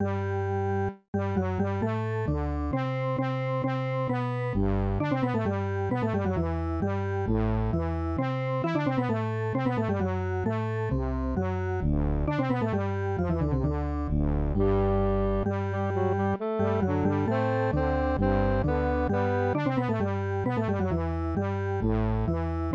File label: MIDI